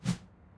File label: jump; Ben 10 - Hero Time Game; cannonbolt